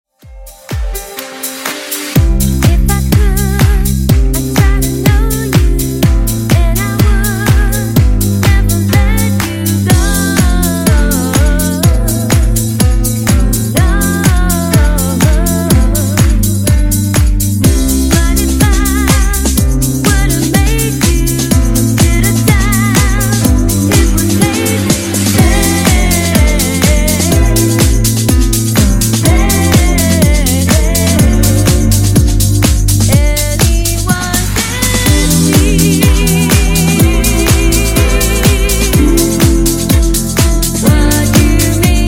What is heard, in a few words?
Funk 124 Bpm